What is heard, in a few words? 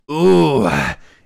ooooh